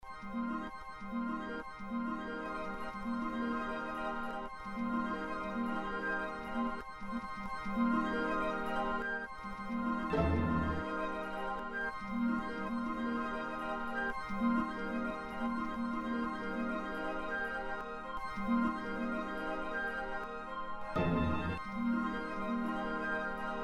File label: instrumental; 2006 glitch instrumentals